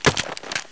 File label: mooncake broke